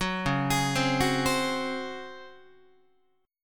Db Major 7th Suspended 4th, Guitar; arpeggiated; JGuitar

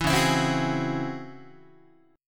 Db-Minor Major 11th Guitar, DbmM11 chord; strummed; JGuitar

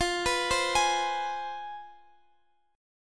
C Major 7th Suspended 4th Sharp 5th, F, chord

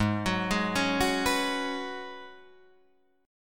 Ab Minor 11th, Ab, Guitar, DADGAD

